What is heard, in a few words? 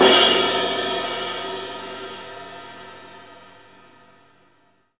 crash; East Collexion; Drum 1 Dry